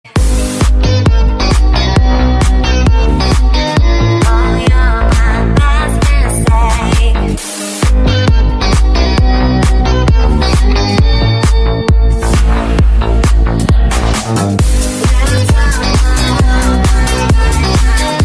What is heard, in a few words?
Bass; S8